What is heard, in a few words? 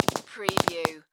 Electric Spark Burst